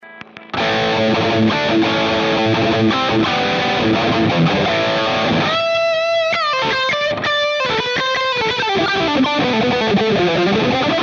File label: brit JM pre HU screamer, PODxt Live